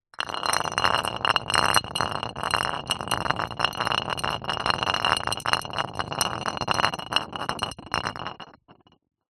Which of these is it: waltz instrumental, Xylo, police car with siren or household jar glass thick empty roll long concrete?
household jar glass thick empty roll long concrete